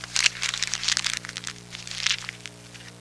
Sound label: CRUMPLED; HAUS